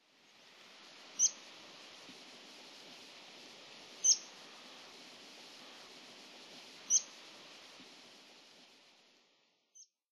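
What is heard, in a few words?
buff-bellied pipit, nemuro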